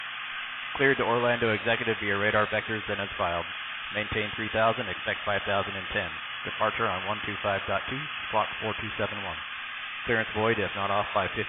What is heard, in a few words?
Cessna 172 DC One X; Zulu 4; sound comparison